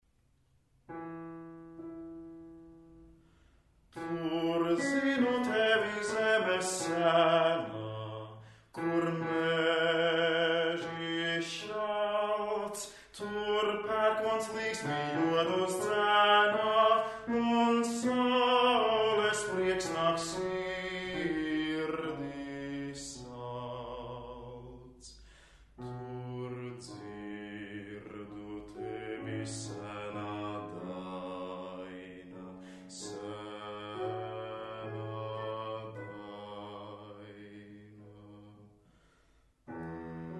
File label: bass; Latvijā; Dziesmu svētki 2013